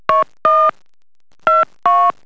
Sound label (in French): tel touches, telephone